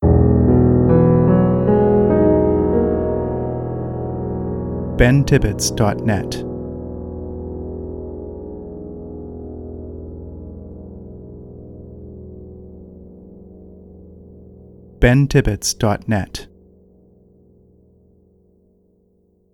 Deep Tender Piano Chord Ident

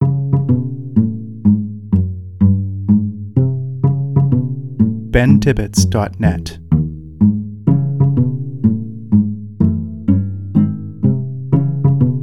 pizzicati; pizzicato strings, designed to accompany moments of humor and subtle absurdity

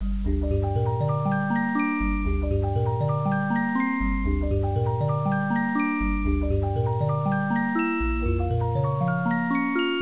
game over; Bubble BreakOut; juvenilia

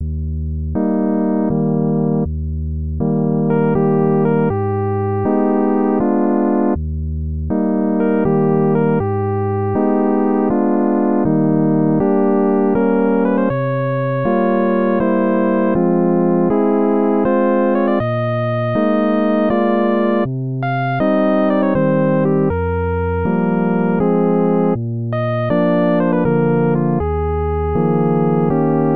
leos waltz, chiptunes

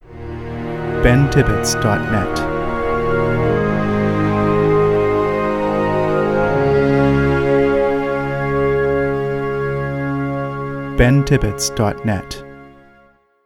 Warm Orchestra Ident

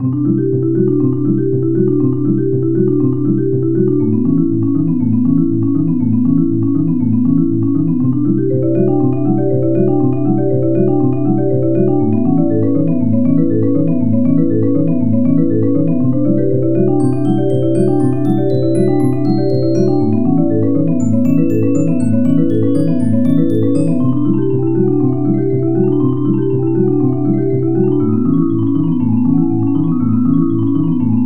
forest village, chiptunes

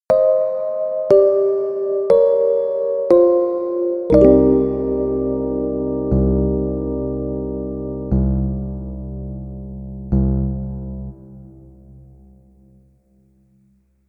Game Over; chaosweapon

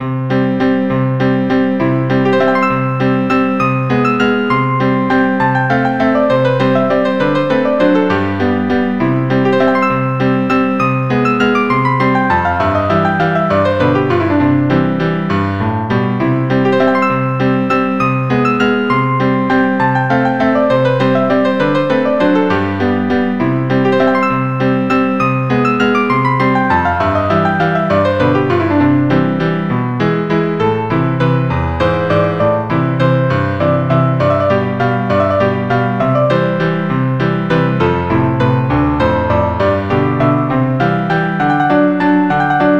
scherzo in C major, chiptunes

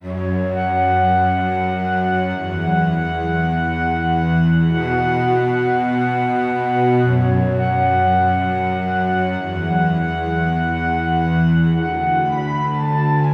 clarinet solo

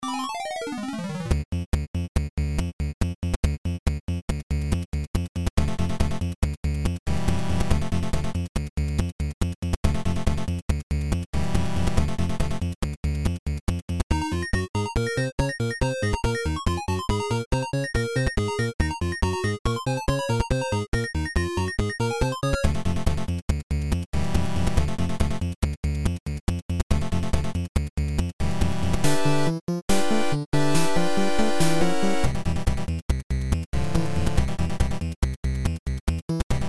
flaming fur level 1; juvenilia

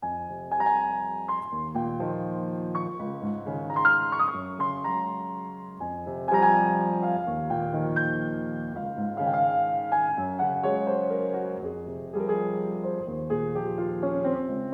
waltz

